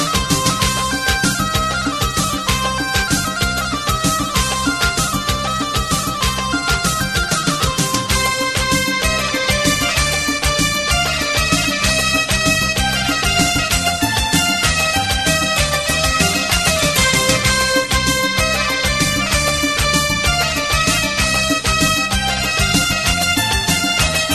Melody

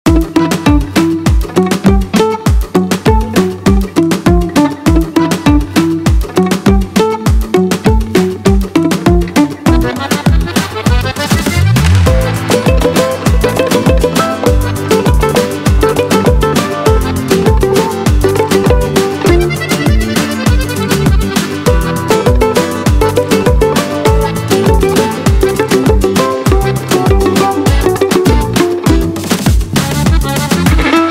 Violin

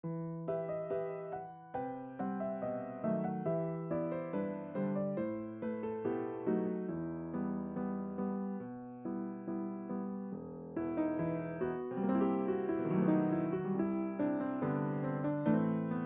Piano

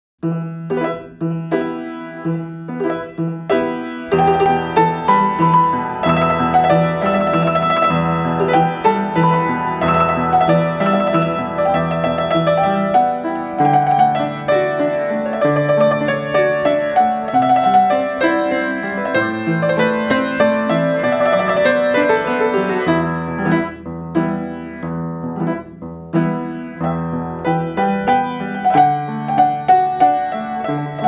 Piano

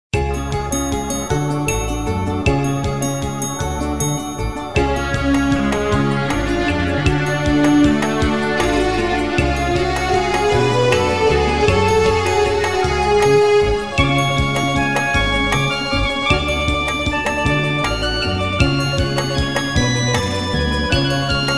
Melody

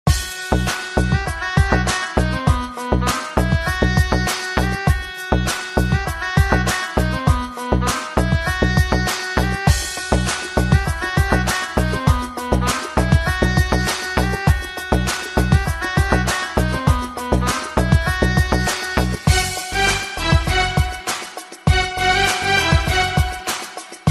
Flute

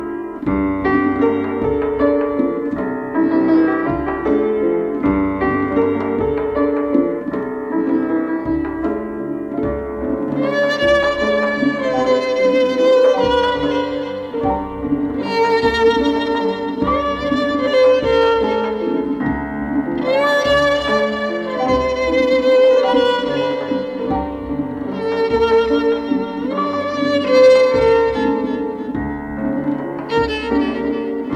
Violon

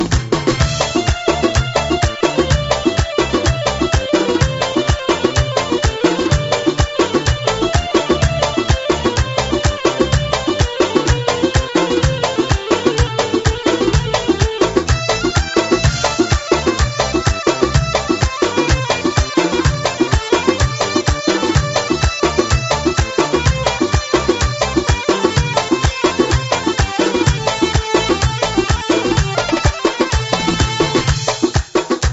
Melody

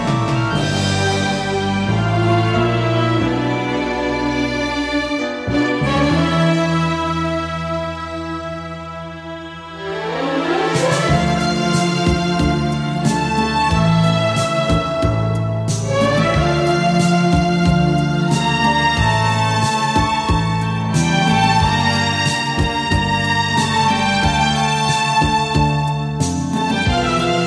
Saxophone

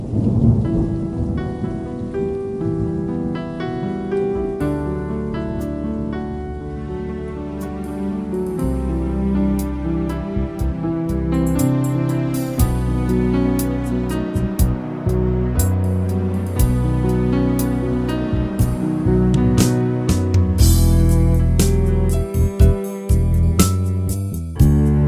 Piano

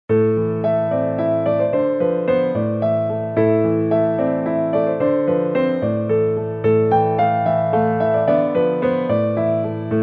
Piano

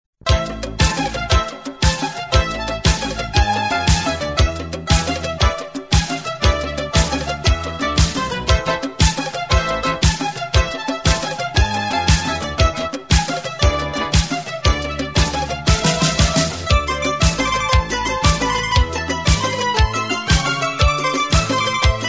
Melody

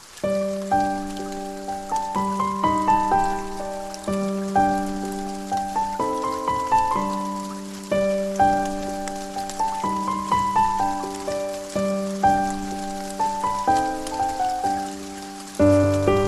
Piano Trombone

